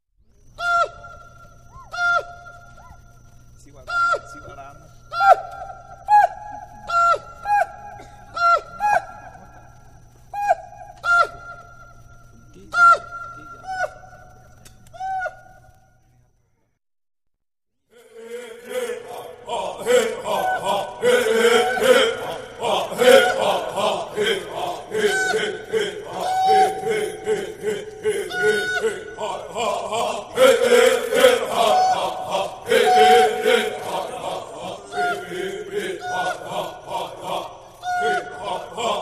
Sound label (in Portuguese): Canto da caçada com fogo